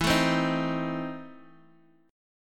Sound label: E Major 7th Suspended 4th Sharp 5th E down Guitar DADGAD